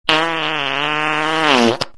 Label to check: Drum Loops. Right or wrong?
Wrong